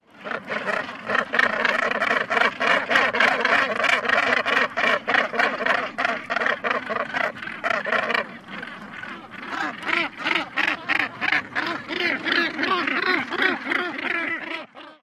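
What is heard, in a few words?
Northern Gannet, calls of adults at colony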